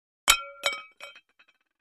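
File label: Large Tile Square Pieces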